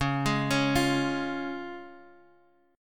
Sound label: C sharp Major, Guitar Open G